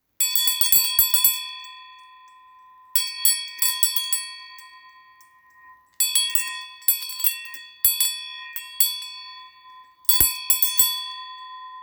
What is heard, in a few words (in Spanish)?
Campana ritual india